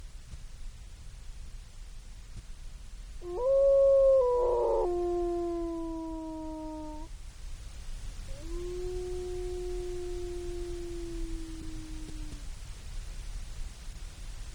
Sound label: Solitary Wolf Howling With Vocal Cracking; alaska acoustic highlights; MAMMALS